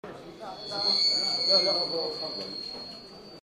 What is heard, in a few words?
loop